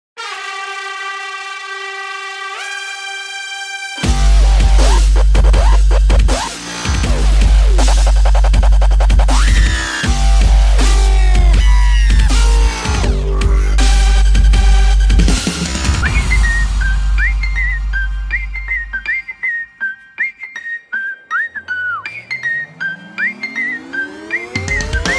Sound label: Movie BGM Ringtone; Theri